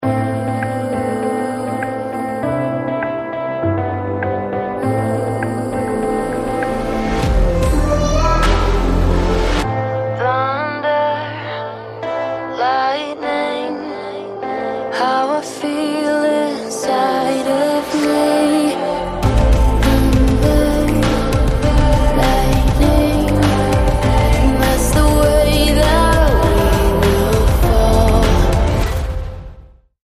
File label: Thunder Lightning; エレクトロ フォン ミュージック